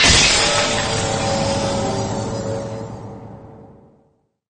Magic

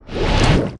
Attack

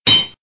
game sell

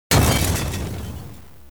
Explosion

